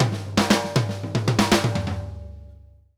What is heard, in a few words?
Drumworks SP fills 120; Sampleheads; New York City Drumworks VOL-1